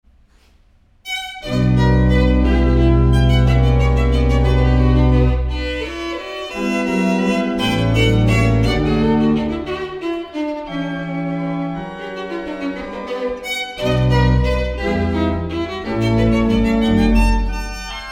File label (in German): Gigue; Sechs Stuecke; rheinberger